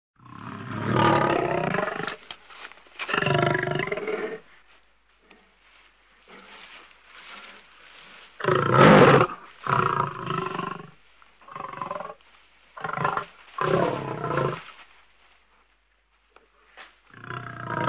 Leeuw Grommend; KMP Film Video Sound Effects

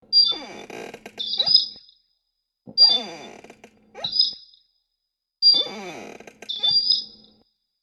schommel

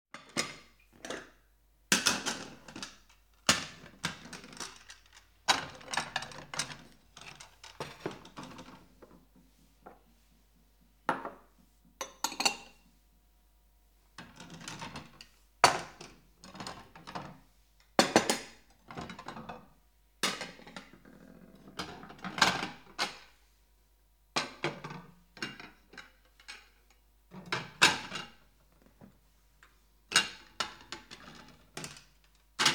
Serviesgoed; KMP Film Video Sound Effects

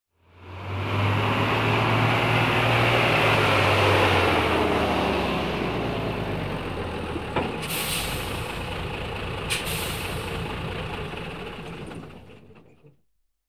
Vrachtwagen Nadert en stopt; KMP Film Video Sound Effects

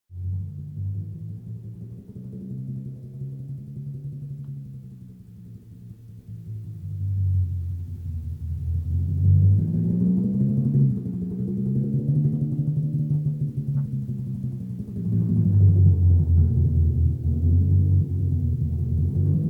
Trommelslag Roffel; KMP Film Video Sound Effects

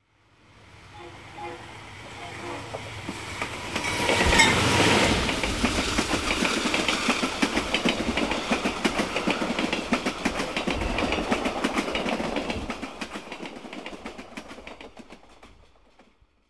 Trein Passeert; KMP Film Video Sound Effects

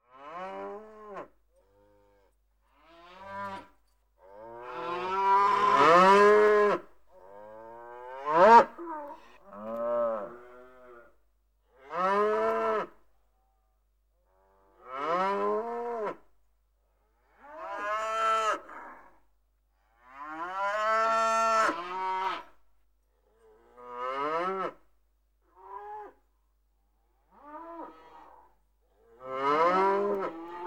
Koe - Kudde; KMP Film Video Sound Effects

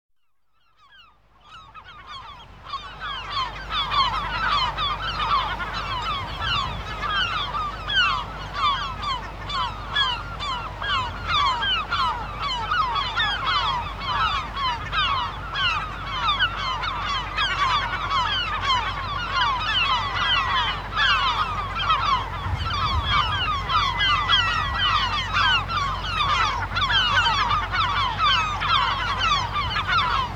Krijsende Meeuwen In De Duinen; KMP Film Video Sound Effects

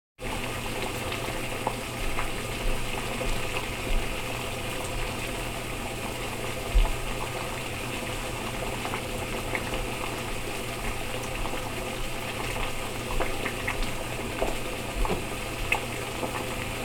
Kokend Water; KMP Film Video Sound Effects

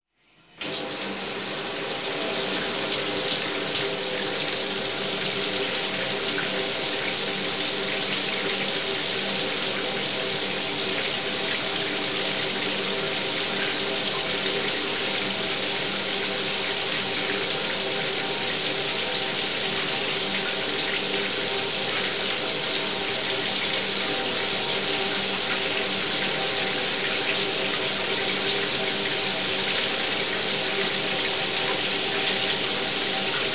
Douche, Aan, Loopt, Uit; KMP Film Video Sound Effects

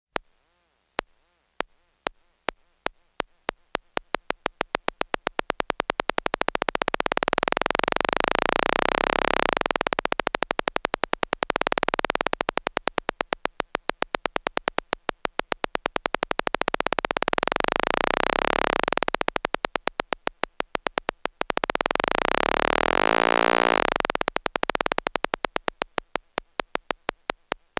Electronische Druppels; KMP Film Video Sound Effects

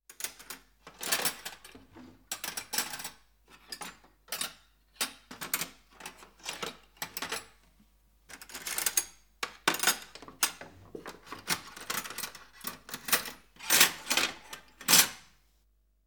Bestek In La; KMP Film Video Sound Effects

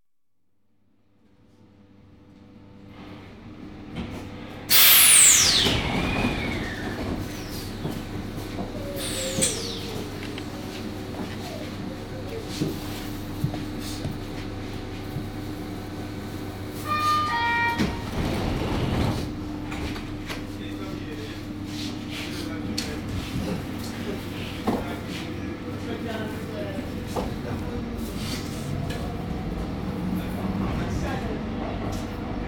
Rit In De Metro Van Amsterdam; KMP Film Video Sound Effects

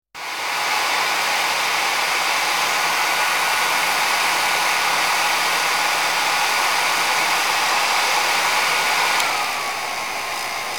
Haardroger; KMP Film Video Sound Effects

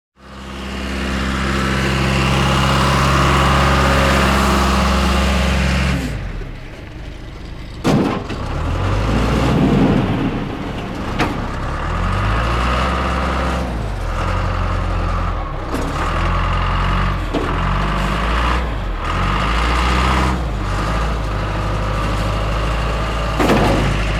Trekker Aan het werk; KMP Film Video Sound Effects

